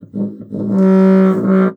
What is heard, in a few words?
MULT, bassoon; Xsample VOL-6